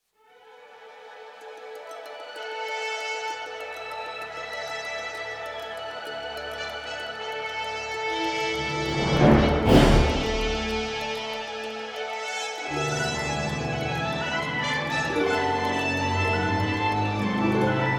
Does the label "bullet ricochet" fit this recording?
No